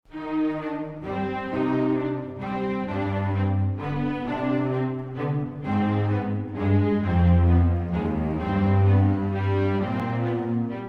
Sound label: Trash Compactor Waltz; Distillery Clean Radio; Half-Life: Alyx